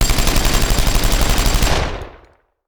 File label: Machine Gun; Sound Ideas; Guns